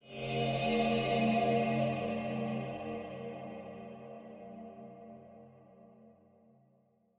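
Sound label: Reverb Cymbal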